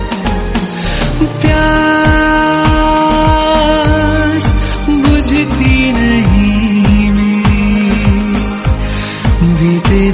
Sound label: sad bollywood song tone ringtone